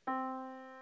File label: Yamaha Disk, piano sound